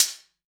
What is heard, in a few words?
Open Hihat; Vengeance Samples; VEE Cymbals